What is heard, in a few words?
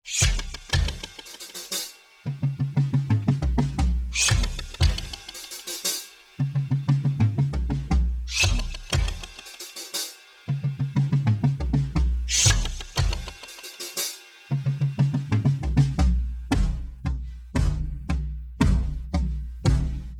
pattern slow, drums